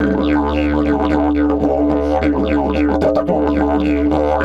108, Didgeridoo

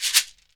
SHEKERE, Synclavier Percussion; ILIO

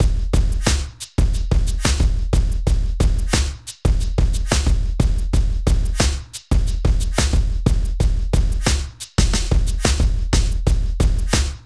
hiphop; INIS; Funky Xtreams

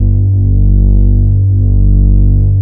MOOG BASS G2; Best Service Dream Experience

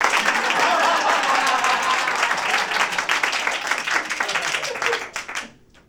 CLAP HAHA; ClapsOohsAahs; SFX

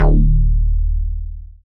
dance bass; Sound & Vision - Gigapack II CD 1

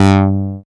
CLAV; Phat & Phunky; East West

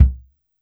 BD Jazz, Yamaha PSR-E323, Brush Kit

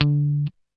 GUI D3, E-Gui Muted; Sound & Vision; Gigapack I CD 2

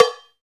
CowBells; Club-50 - Foundations Roland; CowBel+Metl

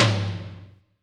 tom, drums; Yamaha SY85

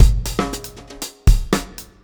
Beat, Drums, Eclectic Electronica

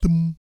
BASS THUM; Spectrasonics; Vocal Planet; Jazz FX

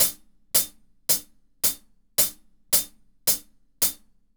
Hi-Hat Groove

